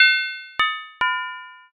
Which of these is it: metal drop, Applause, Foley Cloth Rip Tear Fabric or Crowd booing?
metal drop